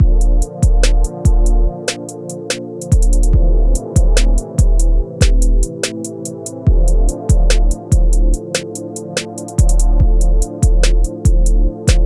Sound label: vi v i guitar